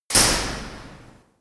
slamming cell door

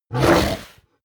coloss groan pain short; kreaturen

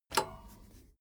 bmx bike pedal foot release regular; sport freizeit

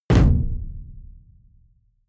sci-fi shot tonal; schusswaffen

